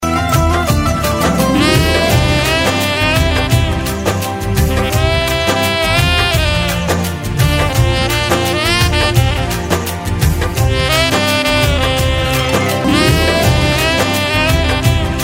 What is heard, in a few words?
guitar; Indian; Dabangg 2